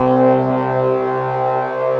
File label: Synthesizer Middle C Notes, Lead